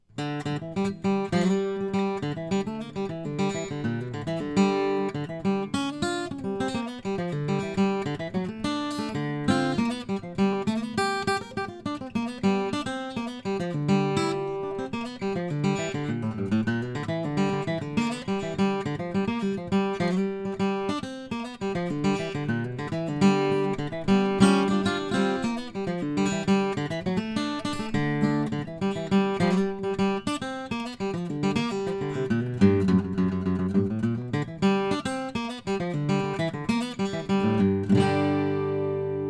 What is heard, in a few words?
Special Maple, guitar; Chris Bozung